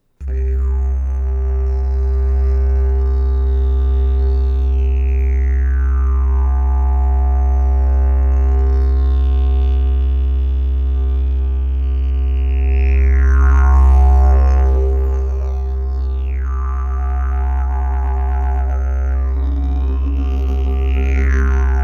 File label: didgeridoo